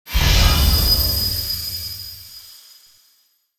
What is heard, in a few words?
Holy magic spell magical sphere; angelic